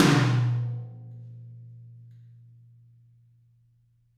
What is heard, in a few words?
Maple Tom Hard, Drums; apulSoft apTrigga2; Steven Slate Drums